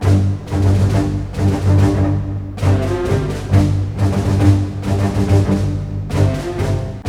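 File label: 70, orchestra; East West; Scoring Tools; Bolero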